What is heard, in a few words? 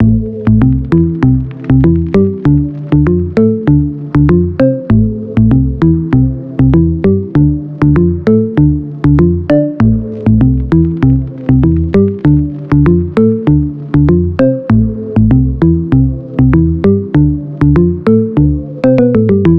melodic loop Am